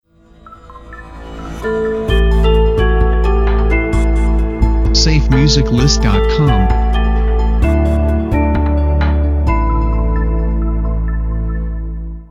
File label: Tech Ident Audio Logo